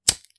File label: Lighter